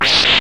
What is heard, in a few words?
Whip